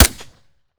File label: fire sup, glock; Urban-Coalition; Decay; arccw ud